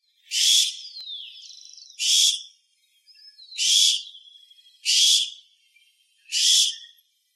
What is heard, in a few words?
Owl Barn